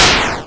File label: explode